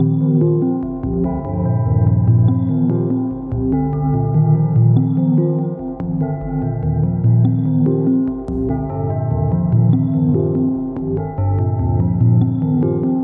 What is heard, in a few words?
wide rhythmic pad and bells, 145; SYNTH AND ELECTRONIC LOOPS